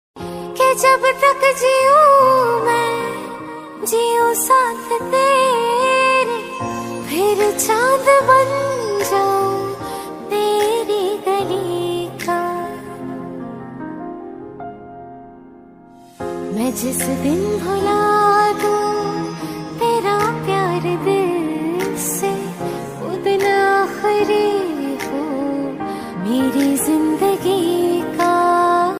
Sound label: Female version hindi ringtone